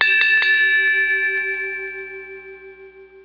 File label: hand